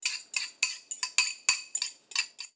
bowl in pot cooking

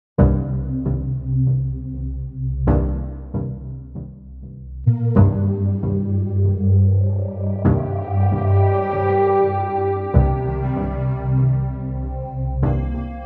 dub techno

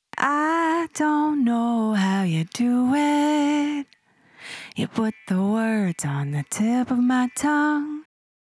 vox dry

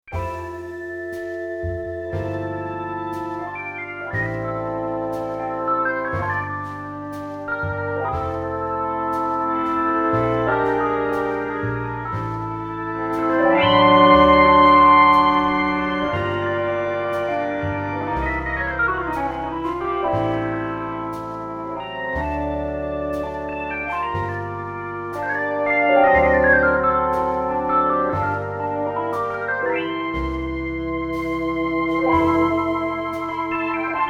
b3 organ osc + fx; 2getheraudio

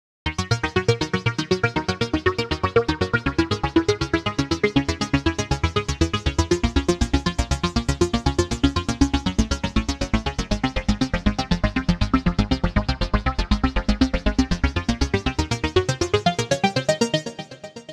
seq 3 steps cosmic trigger

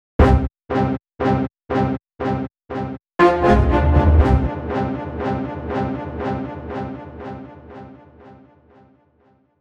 multi delayed

